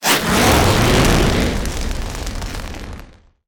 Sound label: Fire Short Swoosh Reverse